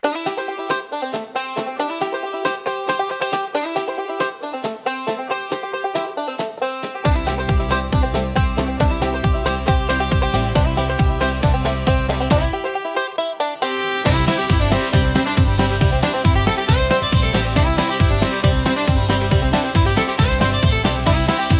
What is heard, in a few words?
Open Road Banjo, country acoustic